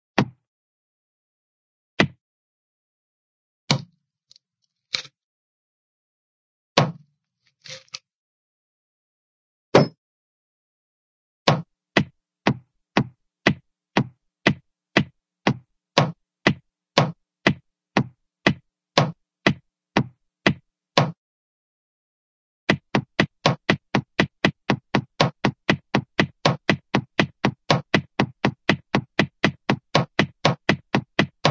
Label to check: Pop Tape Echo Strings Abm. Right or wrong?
Wrong